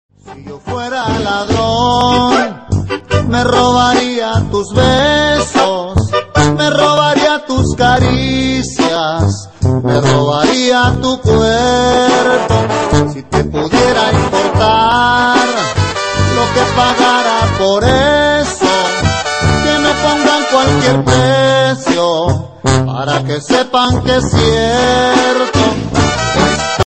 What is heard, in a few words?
ringtone